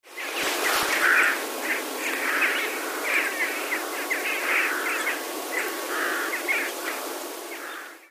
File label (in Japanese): kokumaru